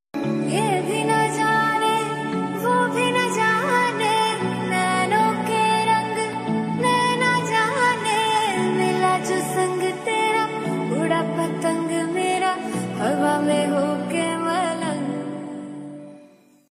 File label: love ringtone